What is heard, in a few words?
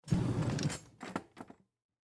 Drawer Open Slide, Drawer Open Slide Silverware Movement; Drawers; Household; Blastwave